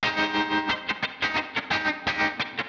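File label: 089 Chill Pop Tremolo Guitar Ab major, Chill Electric Guitar; Pop Rock; Pro Tools Essential Loops; Digidesign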